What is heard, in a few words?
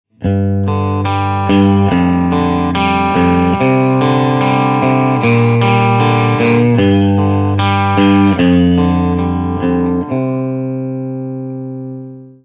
G5 6L6 crunch